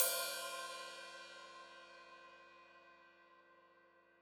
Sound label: Hat Open, Hi-Hat; Cymbals Stereo; Deeply Sampled Drums